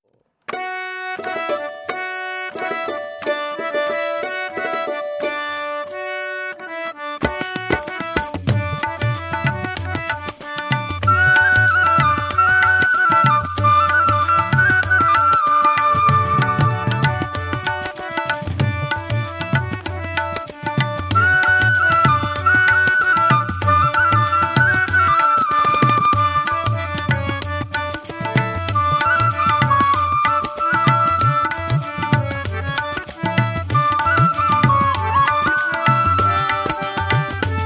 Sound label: Ladies Karaoke D Pancham